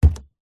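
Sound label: foley footstep single on wooden floor barefoot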